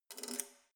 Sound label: letterbox metal key pull out